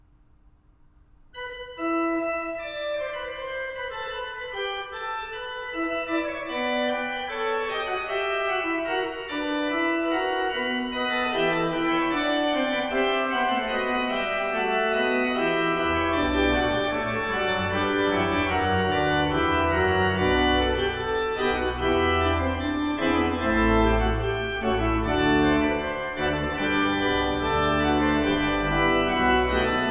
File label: Bruhns E minor, organ